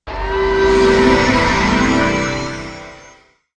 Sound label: heal